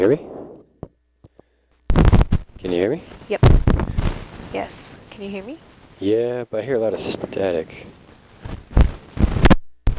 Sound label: social static